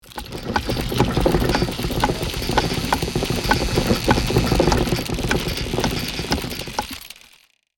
Medieval castle drawbridge retracting

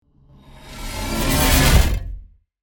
Metal wires transition

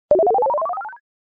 Arcade 8 bit power up; Level Up

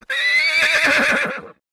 Horse neigh and huff

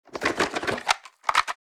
Board game box open; Entertainment and Leisure

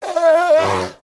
African elephant airy whooshing breaths

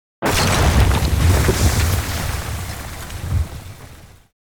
Large explosion with debris falling rocks

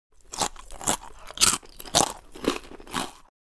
Eating crackers crispy chewing

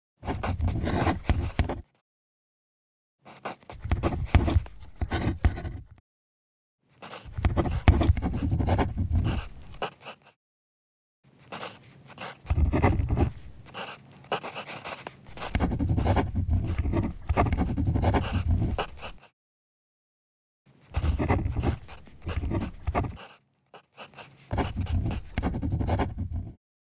Writing on paper handwriting pen long

